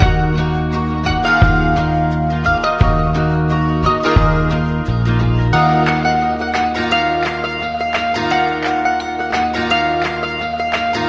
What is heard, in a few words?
guitar, Jazzmatazz loops